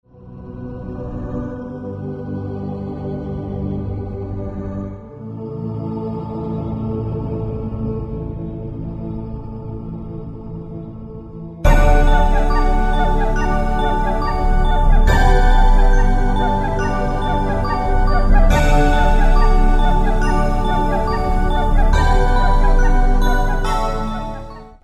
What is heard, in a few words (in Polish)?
muzyka bez oplat